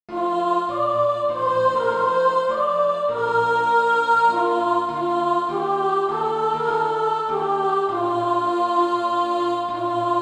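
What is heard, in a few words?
soprano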